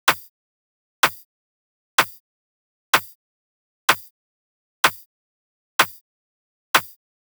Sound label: Drum Loop Clap 126 Gm